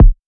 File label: Kick F, Hip Hop; Drum One Shots; Cymatics; Cobra Hip Hop Sample Pack